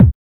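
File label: HSE-BD, house bass drum; X-Static Goldmine 1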